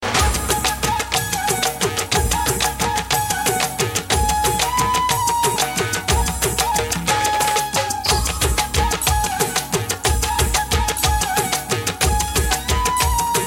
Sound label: Flute Hindi Melody